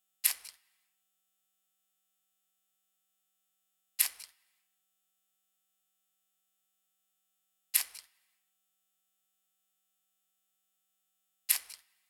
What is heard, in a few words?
Perc